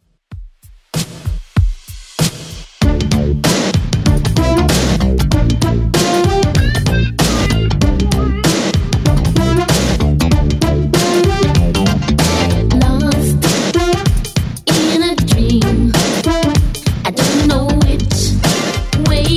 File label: 80s Dance 95bpm; Redrum